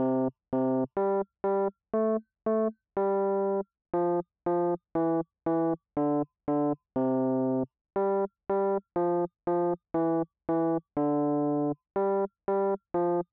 Classic Electric Piano, Twinkle Twinkle Little Star; Logic; IDeATe